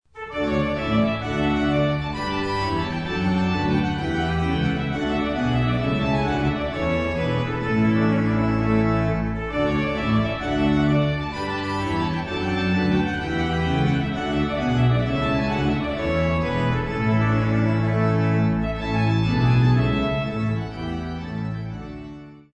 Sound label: Tuba Tune